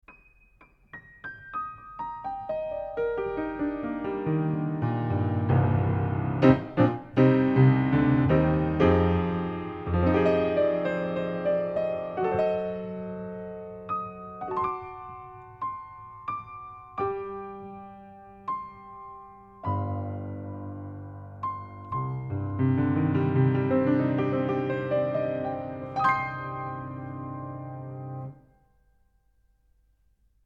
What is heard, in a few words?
Piano, 30cm Omni Pair